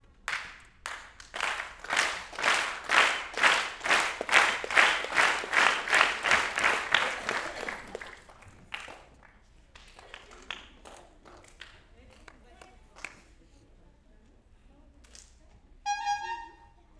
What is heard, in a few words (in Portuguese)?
Apresentacao palhaco plateia batendo palmas em sincronia; multidao